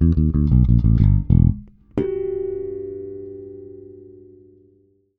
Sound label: BASS X